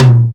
dry tom drum a sharp key

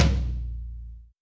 hi tom a sharp key; tom drum

